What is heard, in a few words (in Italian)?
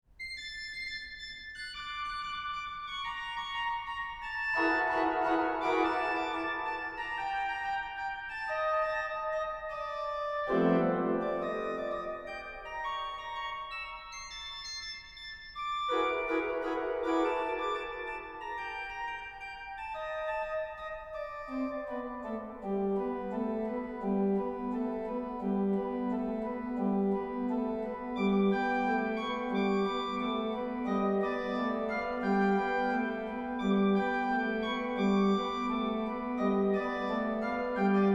piccolo ragtime, organist; Carlotta Ferrari